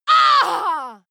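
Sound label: female combat hit hard, screams; schreie; menschen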